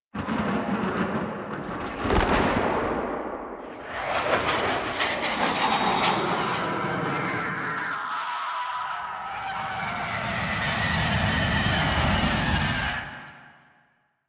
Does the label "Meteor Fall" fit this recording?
Yes